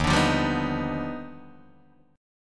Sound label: D sharp Augmented Major 9th D sharp Guitar Open D